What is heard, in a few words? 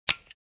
Zippo lighter flicking